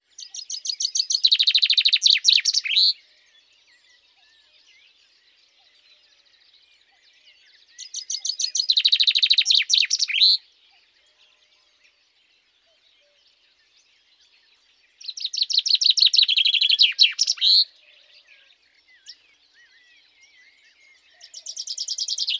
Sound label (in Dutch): vink in moeras